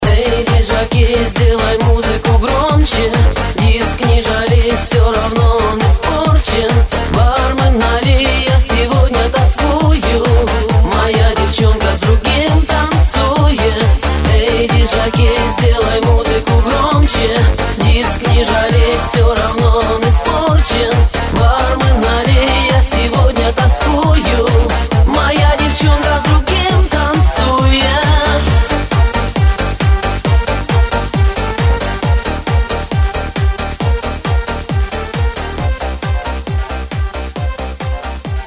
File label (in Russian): klassicheskaya muzyka i retro ringtony na telefon